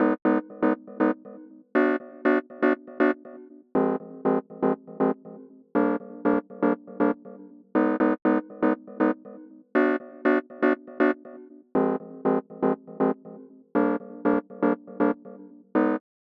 120bpm, Fm, Chords

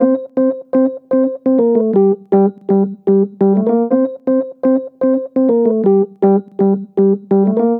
123 Gm Latin Love Riff

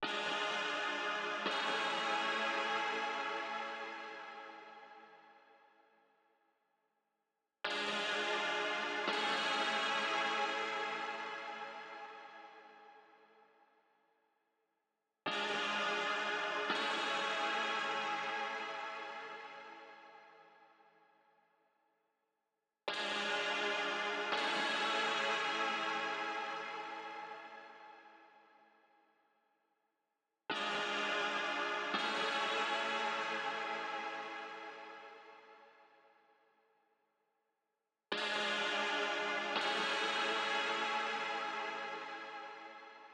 Synth Chords

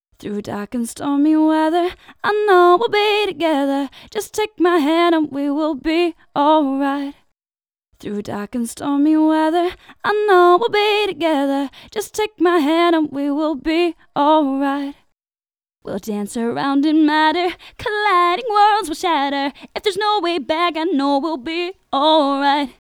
123 Gb Maj Lead Double

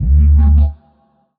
Bass

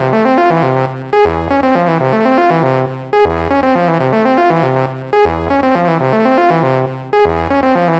Arp 120 Fm

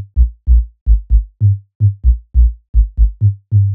bass 128 groover Gm

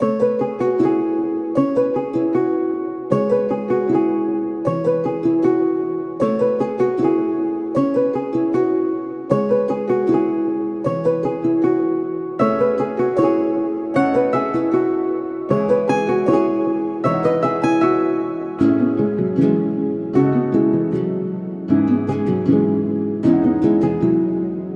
Ethnic Pop Plucks 155bpm A major 7

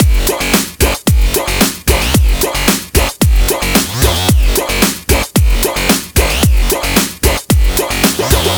112 F- Music Loops

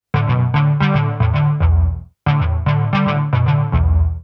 113 E min Synth

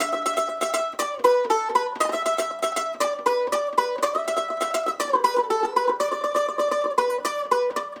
120 E Resonator Mandolin

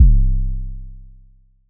Thudder Long Sub

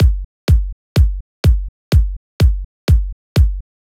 Drum 125bpm Kick

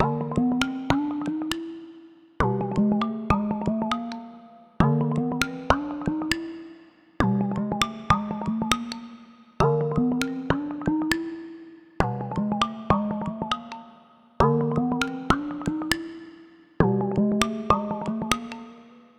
Weird Synth 100BPM Cm Ambient Synthetic

